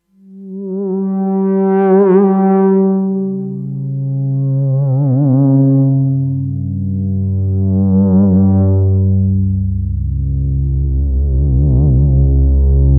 Twilight Pad Tone

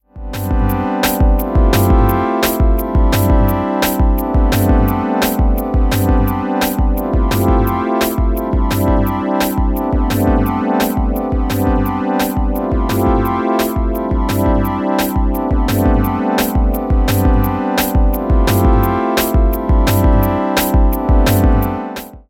Things Motor Vibrato Mix; AudioThing Hainbach Bundle

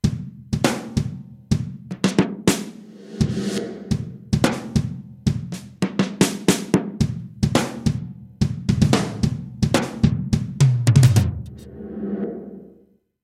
Drums

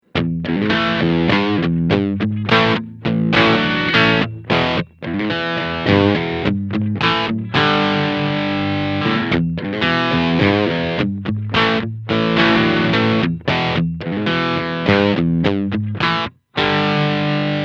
Guitar Preamp Crunch; Two Notes ReVolt

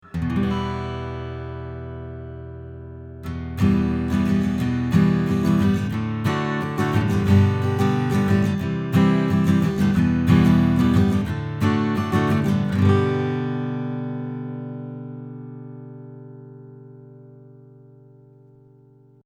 Cardioid Strumming

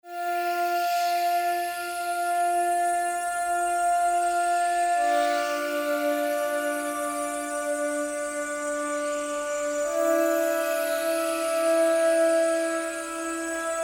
Pads Photosynthetic; Natural Forces

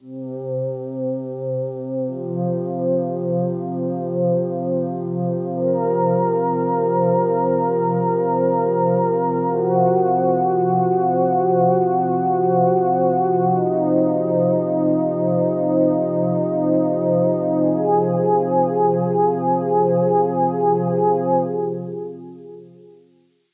Roland Cloud Juno-106

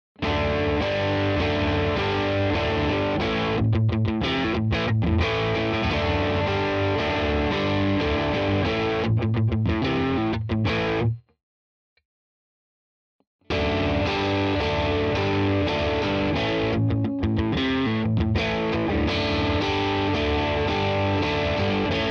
all positions Rhythm Rock

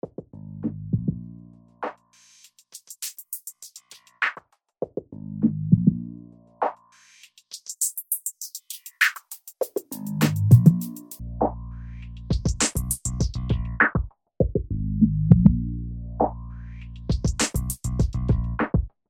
Loop Filter

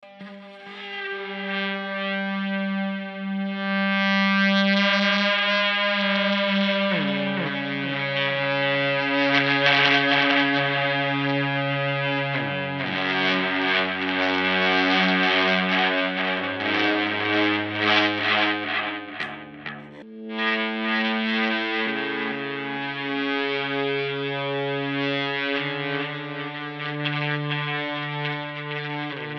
Aeon Delay

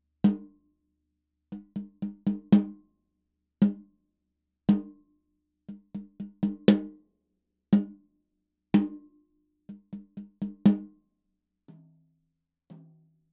Presonus DM-7 Snare Drum

